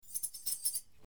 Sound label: Keys jingle